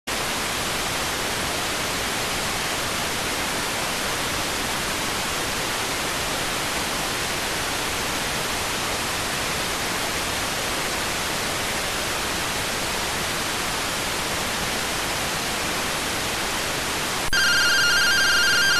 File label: rtty rx